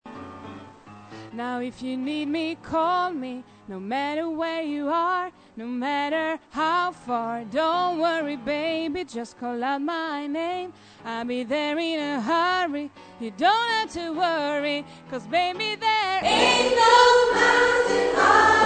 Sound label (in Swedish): sopran